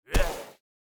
zombie strike; Shelter

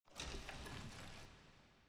ambience room debris; necromastery

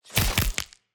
Drone Laser Bone Impact; TANK; 3Souls

